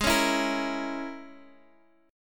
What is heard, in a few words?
Bb Minor 7th Flat 5th